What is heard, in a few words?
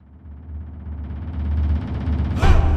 intro Free Spin; netent; kingof3kingdoms